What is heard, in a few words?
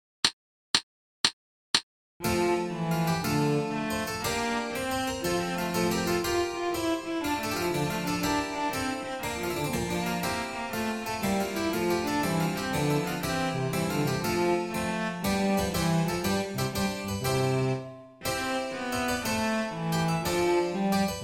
giga, no repeats full tempo no solo no clicks